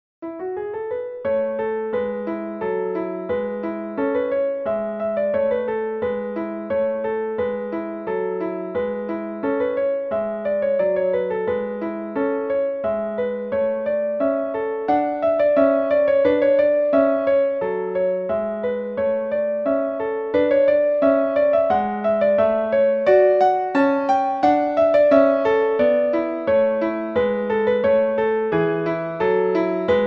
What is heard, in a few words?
The Traveler, piano solo